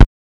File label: Moog BD; Moog Modular55